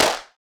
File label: clap; bespoke - Floral v.1; drum oneshots